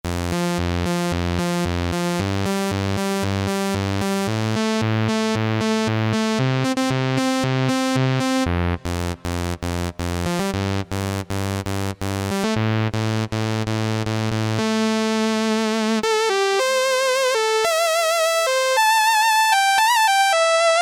Simply Saw, Alesis Z2 EuroDance

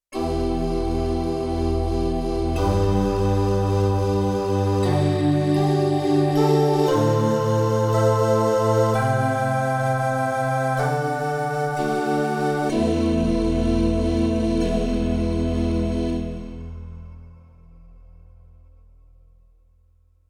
Pad; General Performance

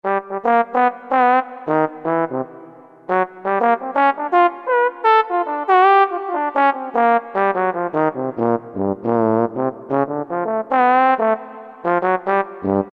Trombone, Korg T3

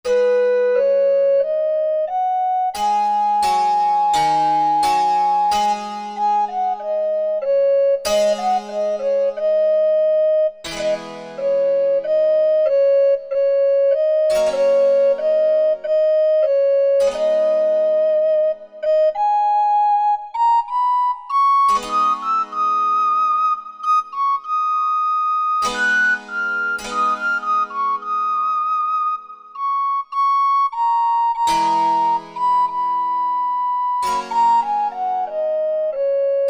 Saxons

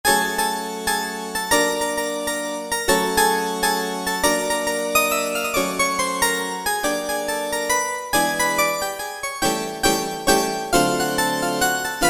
Vox Harpsi, Roland SRX-07; Roland SRX-07 Ultimate Keys

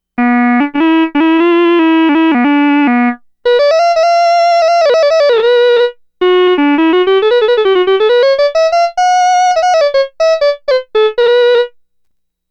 Fuzz Guitar, Roland SH-2000

